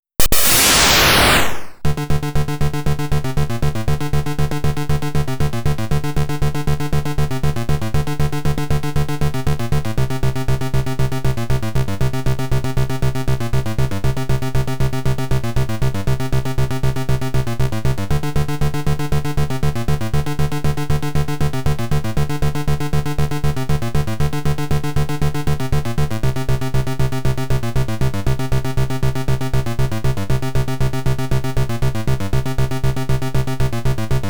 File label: Pinball Action, Slot BGM; Pinball Action RED